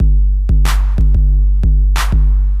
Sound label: bass heaviness, Drum loops, Electro